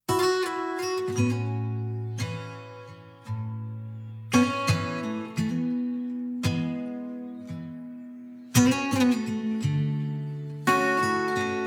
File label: BLUES